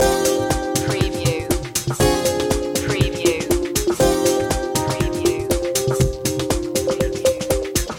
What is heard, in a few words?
turn on loop